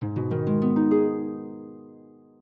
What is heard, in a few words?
harp; lottharp; lottblocks